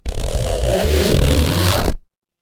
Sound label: paper fabric zipper